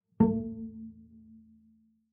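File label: Bass pizzicato fortissimo sul D A2, Double Bass; MIS Pitches - 2014; Strings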